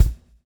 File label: SWNG